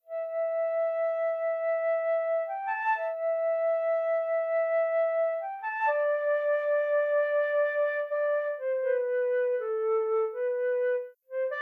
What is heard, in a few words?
City Flute Busker; Xenoblade Chronicles 3